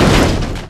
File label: vehicle impact medium, v8; Source Engine; Portal 2